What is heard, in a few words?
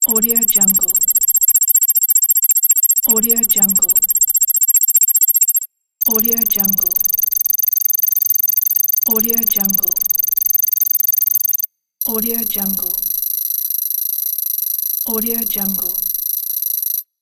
data processing